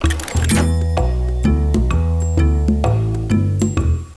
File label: Ice Door Close; fisher price ready for school kindergarten